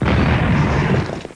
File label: Concrete Death